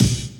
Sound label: Kick